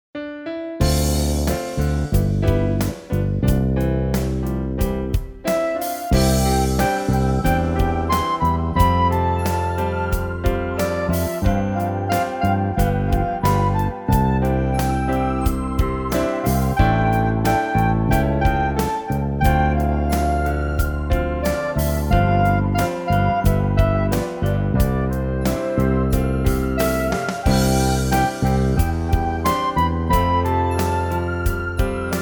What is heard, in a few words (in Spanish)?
Pista con melodia instrumental